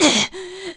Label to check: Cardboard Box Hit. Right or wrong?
Wrong